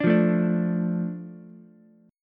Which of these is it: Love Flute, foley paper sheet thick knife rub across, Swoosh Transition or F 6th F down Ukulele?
F 6th F down Ukulele